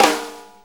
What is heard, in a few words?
4-4 Snare Flam, Drum Loops